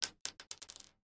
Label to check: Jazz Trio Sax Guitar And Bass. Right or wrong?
Wrong